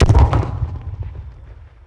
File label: resupply mortar fire; Star Wars Clone Wars; XBOX; Xbox Live Effects